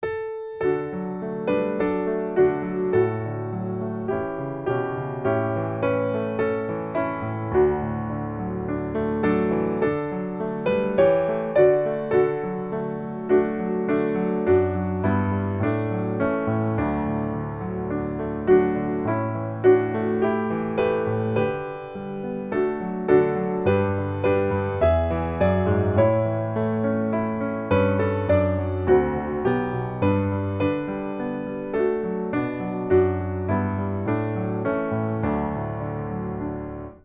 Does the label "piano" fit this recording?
Yes